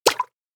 Aquatic water game menu ui select

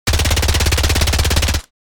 MAC 10 submachine gun short burst; war

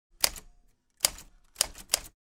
Typewriter vintage typing short

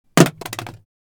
Plastic item drop onto hard surface; impacts; gfxsounds

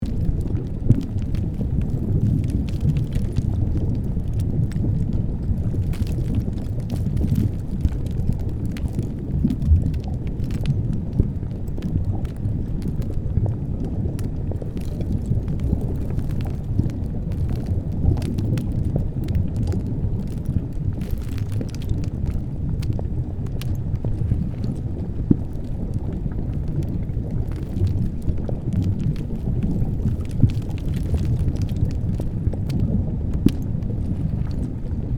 Volcanic debris rocks rolling ambience loop; nature

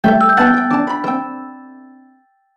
Christmas game menu ui fail

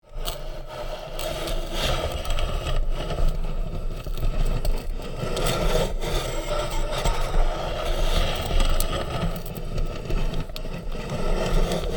Rusty bicycle slow steady grinding gears

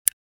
Assault rifle fire selector; gfxsounds

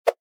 Cartoon whoosh

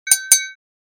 Glass cup tap call to attention

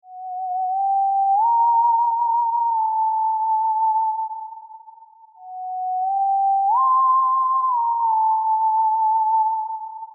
Spooky halloween theremin

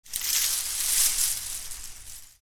Beaded door curtain sway rattle movement; blinds

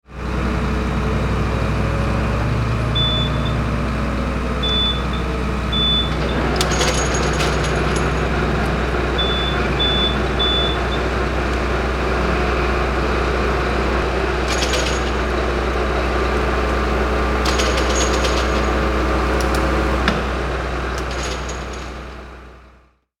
Truck crane moving and lifting

